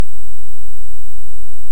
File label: Hydrophone; OneOcean